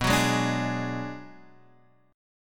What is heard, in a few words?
B-Minor 6th B down Guitar Standard; Bm6 strummed; JGuitar